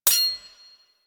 Projectile Timer; Warframe; Cephalon Weave; Weave Boss

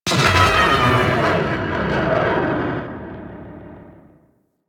Rail Jack Fighter Initial Death Explosion; Enemy Ship; Corpus

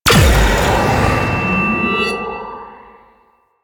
Heavy Slam Ability Charge Up; Warframe; Duviri; Dax

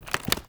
Mockery Foley Melee Swing; Characters; Enemy; Mockeries

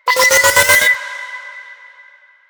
grenade warning; Enemies; Soldiers; Weapons; Warframe; Lasrian; Goonadier

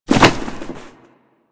Hit Ledge; Foley; Warframe; Tenno

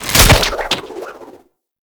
Grapple Rope Break

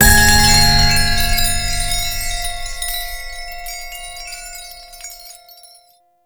Chime Hit; Warframe; Duviri; Easter Eggs

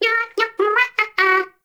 baby vocal singing; Nokko; Warframe; Nokko Colony; Find Nokkos

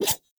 Dagger Whoosh Heavy; Weapons; Enemy; Daggers; Sinecure Dagger

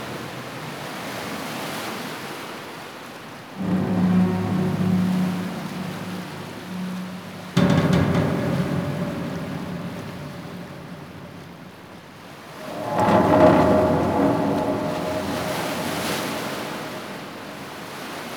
Amb Loop; Warframe; Quests; Wraith Quest

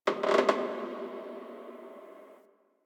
Wooden Creak Small

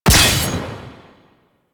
Crack Impact Slash; Warframe; Warframes; Khora

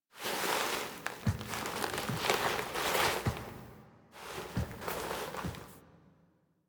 I Couldnt Breathe Foley; Warframe; Sacrifice; Operator

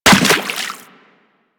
Run Water, Footsteps; Warframe; Teshin

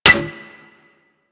Melee Staff Impact Generic

